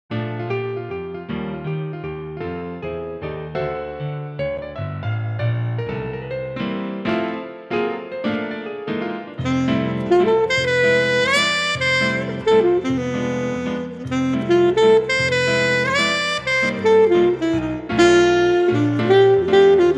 JAZZY WALTZ Alto Sax

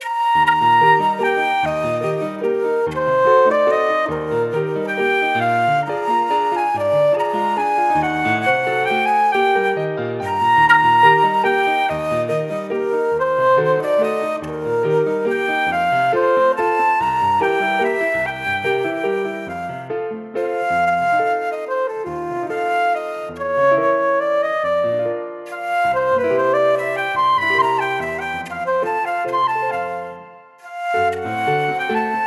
flute

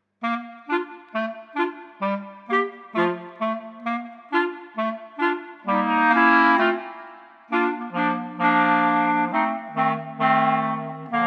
Clarinet Trio

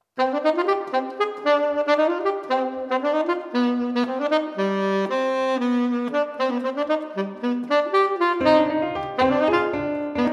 Fugue in C Minor Tenor Sax

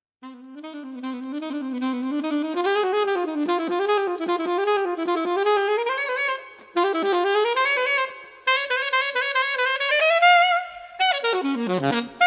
SAX

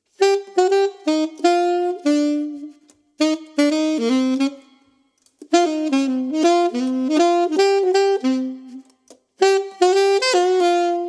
alto sax

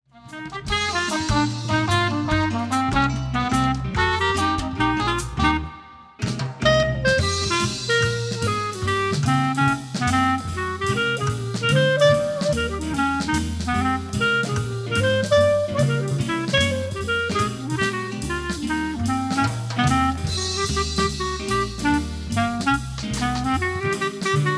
Clarinet

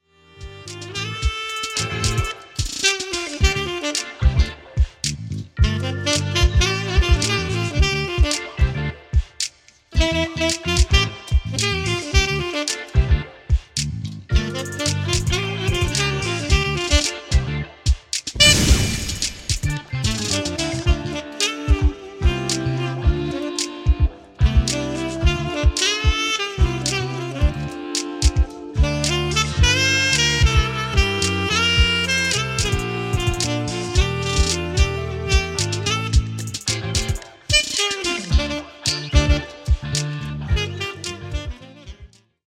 Tenor

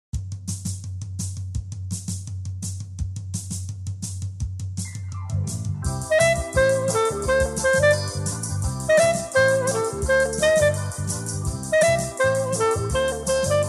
Clarinet

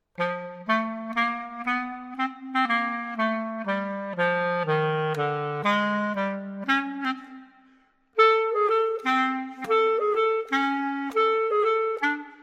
Clarinet; 52 MELODIC ETUDES-CLARINET Bk 1

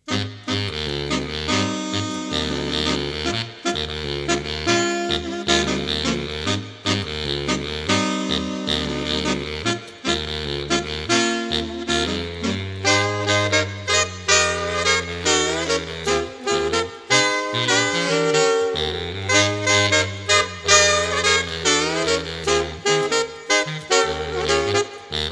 Groove sax 4tet

